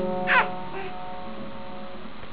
playing piano

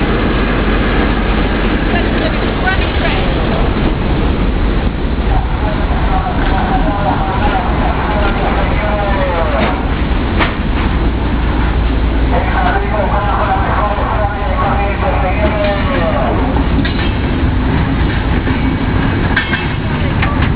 train; Argentina; BuenosAires; LaBoca

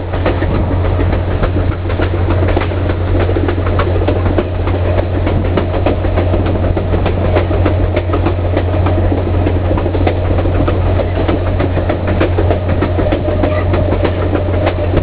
alaska train

